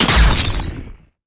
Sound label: Chain Lightning Impact; Evolve; Elder Kraken